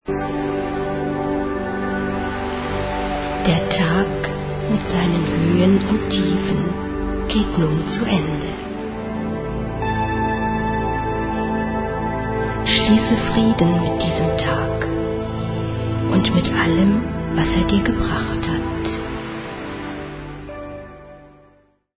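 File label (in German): Relax Now, Meditationsmusik, Entspannungsmusik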